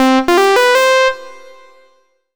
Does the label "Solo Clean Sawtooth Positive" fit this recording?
Yes